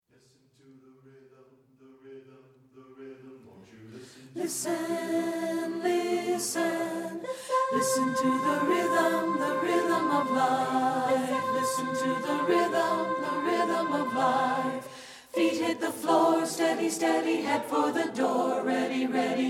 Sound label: Alto, mixed choral